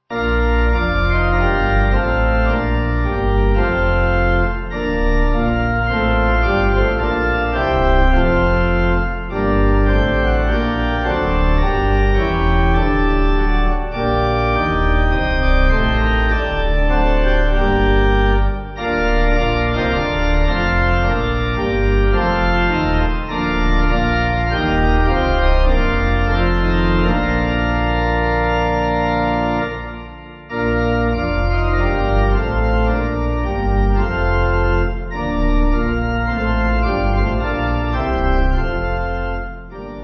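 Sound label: Pipe, Pipe Organ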